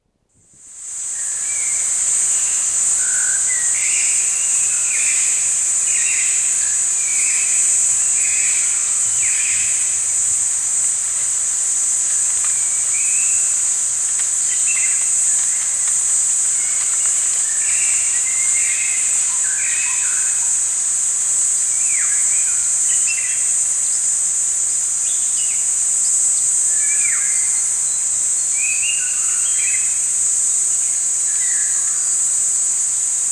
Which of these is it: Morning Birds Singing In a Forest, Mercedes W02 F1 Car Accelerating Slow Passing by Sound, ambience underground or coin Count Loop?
Morning Birds Singing In a Forest